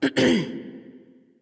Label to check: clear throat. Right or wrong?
Right